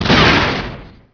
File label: rocket turret; Emperor - Battle for Dune